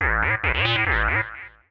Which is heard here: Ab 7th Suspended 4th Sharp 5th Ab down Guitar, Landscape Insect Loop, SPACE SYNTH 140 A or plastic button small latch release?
SPACE SYNTH 140 A